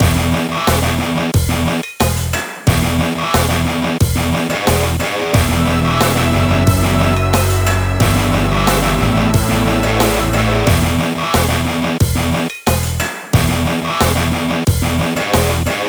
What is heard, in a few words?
Character Select; Character Select (Vintage Score) (Alt. Ver.); killer instinct season 2